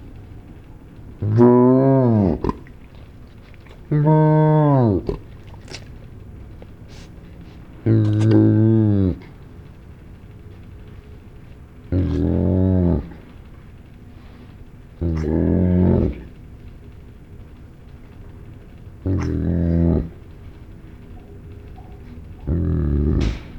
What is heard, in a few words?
hyena bear empty